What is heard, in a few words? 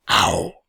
mobs yeti damage; server-nalc